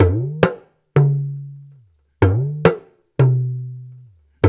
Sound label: AFRO, African Contemporary, AfroTriangle; Spectrasonics; Supreme Beats